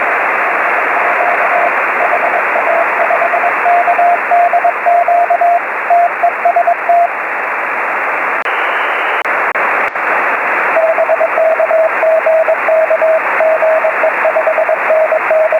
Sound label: 1296 CW; HA5KDQ